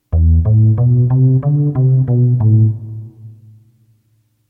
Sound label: melody recognition